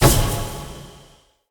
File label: Acorn Fail; Quick Time Events